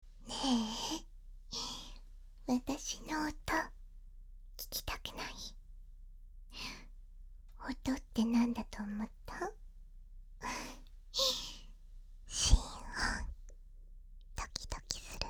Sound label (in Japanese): 心音